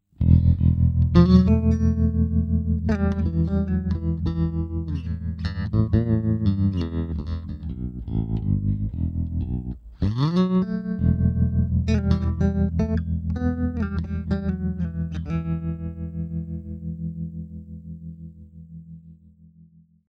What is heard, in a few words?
Tremolo triangle